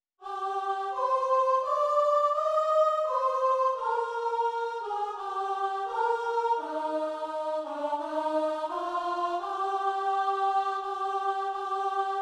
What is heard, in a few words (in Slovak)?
sopran; zborove skladby; vianocne